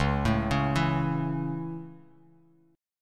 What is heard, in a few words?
C sharp Minor Sharp 5th, C#m#5 chord, Bass Standard; C#m#5 arpeggiated; JGuitar